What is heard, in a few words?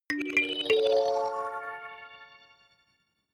notification kalimba magical triad up major